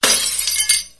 steklo byotsya

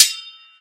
mech zvonkij visokij odinochnij korotkij

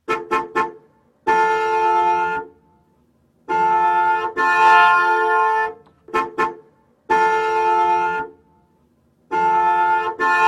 signali avto obemnij 3d zvuk

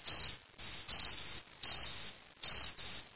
sverchok shumnii blizkii rezkii

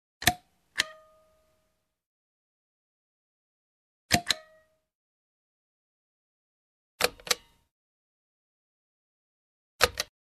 zvuk nazhatiya knopki pereklyuchatelya

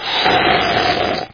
hlebanie shumnoe

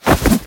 zahvat i brosok protivnika

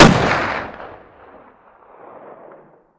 shum uletayushego vdal snaryada

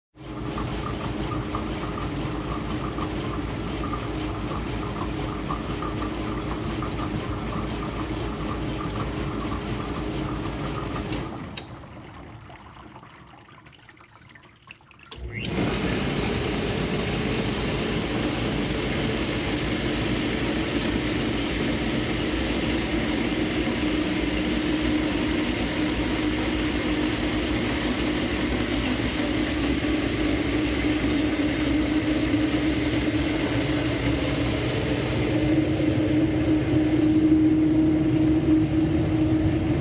zvuki raboti posudomoechnoj mashini

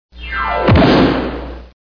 grohot s glissando iz multika

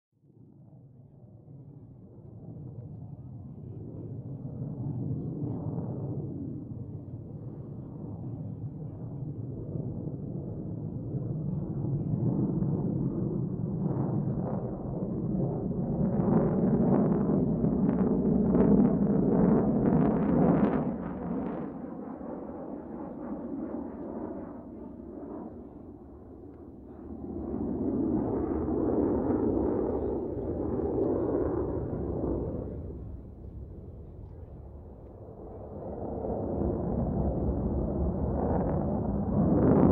narastayushij dalnij zvuk polyota istrebitelya s vistrelami

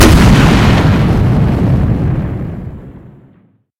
tyazhelij raskatistij zvuk vzriva

